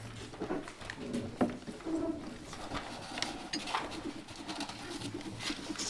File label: cutting paper